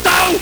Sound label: LSDj, Nintendo Gameboy; 300 Drum Machines